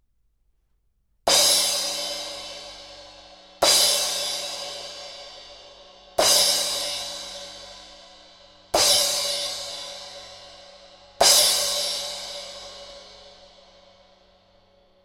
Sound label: Custom 14 crash